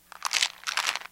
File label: Pills